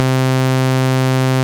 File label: BS MINI, Analog Bass, Synth Bass; Roland LCDP02 Guitar and Bass